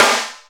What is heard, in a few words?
Pic Snare; Quasimidi Rave-o-lution 309